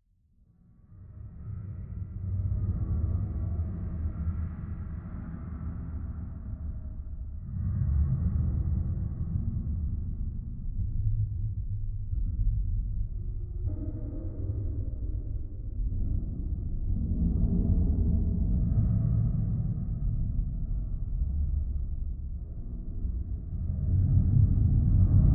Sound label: Underwater Ambience Metal- Ambient Metal Very Low And Rattly